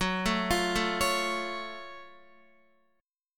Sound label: Gb Minor Major 7th Gb down Guitar; arpeggiated; JGuitar